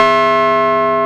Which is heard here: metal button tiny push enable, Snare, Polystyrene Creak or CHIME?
CHIME